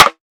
original steel snare drum d key, snare sound tuned to the D note